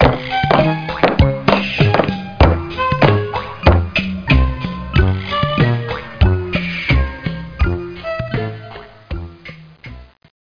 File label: sick march; MacAddict; Stay Tooned Demo